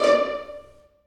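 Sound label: 1st violins pizzicato C#5; Sonatina Symphonic Orchestra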